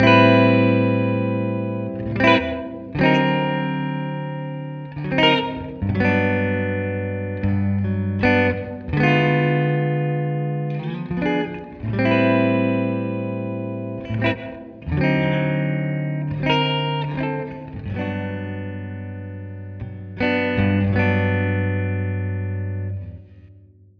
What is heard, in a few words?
Rhy Gtr clean; lo-fi; recording